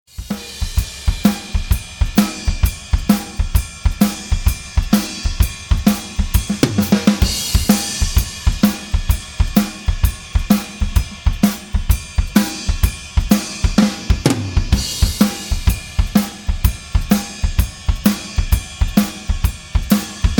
Drums solo MKH40; Drums solo - MHK40 in Glyn-Johns-Technik als Overheads

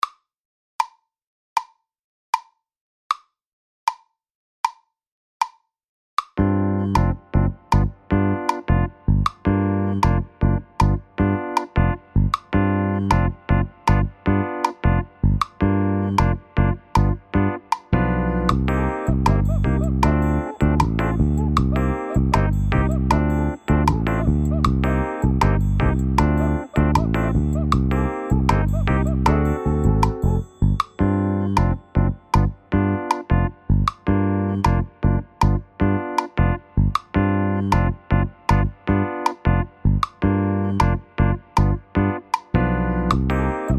Partido, latin

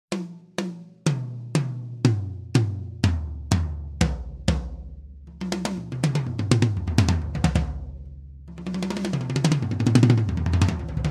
Toms flat

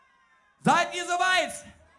honk; feedback

